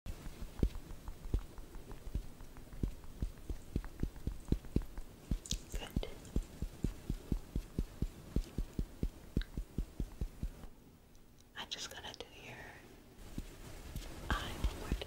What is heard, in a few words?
Beauty blender